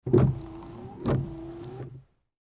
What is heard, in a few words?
chrysler lebaron windshield wiper single wipe